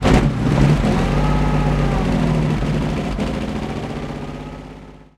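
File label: cromwell engine interior load; cannons; War Thunder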